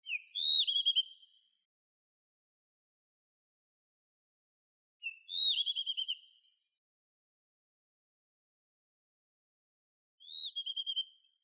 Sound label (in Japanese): isohiyodori saezuri